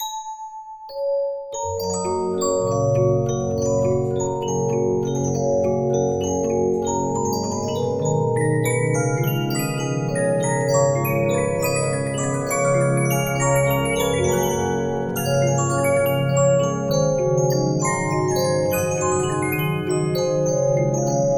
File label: Regina, 15inch disc musicbox